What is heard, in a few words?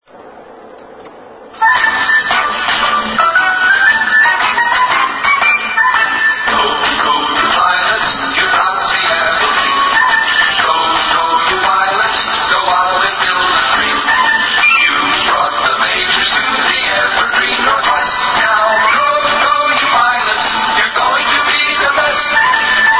theme song; 1969 Seattle Pilots